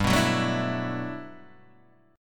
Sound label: G Major 13th, Guitar DADGAD